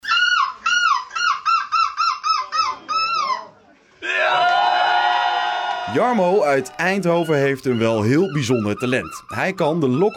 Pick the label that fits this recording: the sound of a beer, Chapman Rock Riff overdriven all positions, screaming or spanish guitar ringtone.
screaming